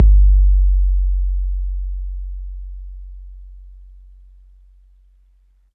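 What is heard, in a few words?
bass drum 808 MPC60 Click F#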